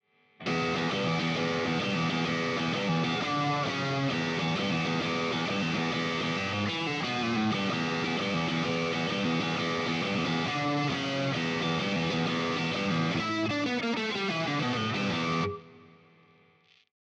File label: Neck Drive, electric guitars; Gusta GST Standard; gst models